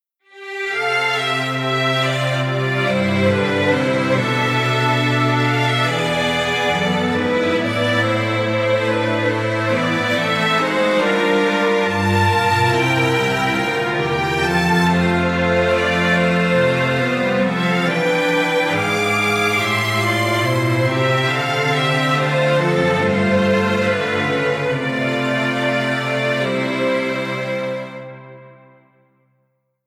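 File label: Strings Polyphony; Sample Mohan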